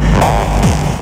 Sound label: Hard Kick